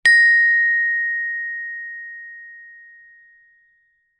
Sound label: musical instrument gender gamelan single mallet hit high mid